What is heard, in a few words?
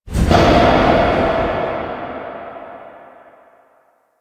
Subway Misc; Warframe; NineteenNinetyNine